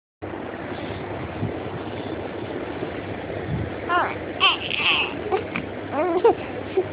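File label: baby hungry, male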